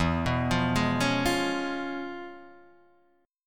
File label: C sharp 7th, E; arpeggiated; chord